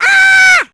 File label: AAAH; Rabbit-Wars